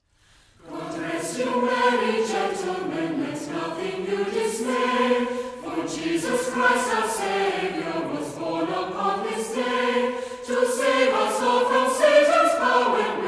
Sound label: God Rest You Merry Gentlemen, Choir; Brisbane Concert Choir